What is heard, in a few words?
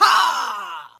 "ha", Voice